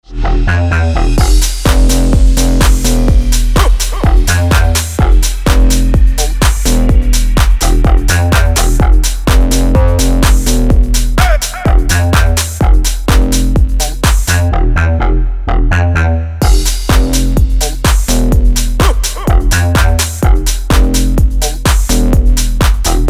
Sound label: bass inside